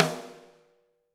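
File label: snare drum, Drums; Bob Clearmountain Drums I; SD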